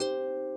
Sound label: harp